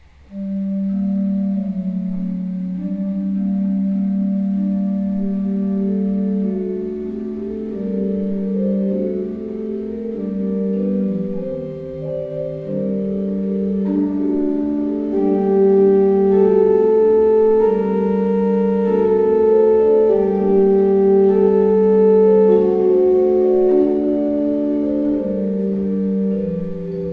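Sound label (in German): Kleuker 15 II P, pfeifenorgeln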